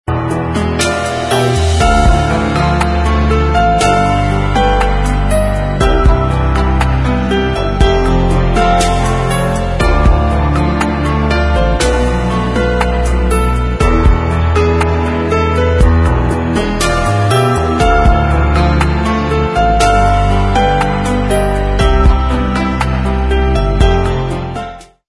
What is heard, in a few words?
blue hills instrumental